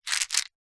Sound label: food candy sweets mint lozenges in plastic tub short movement